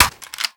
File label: mp9 reload hit; foley; Call of Duty Modern Warfare